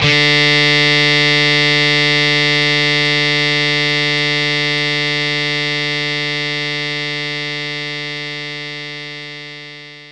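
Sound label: Distortion Guitar D3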